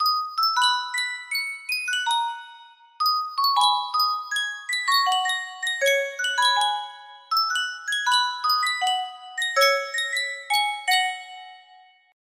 sankyo music box, music box melody

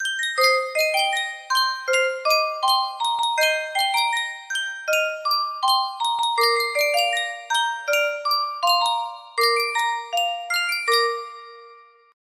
Sankyo music box melody

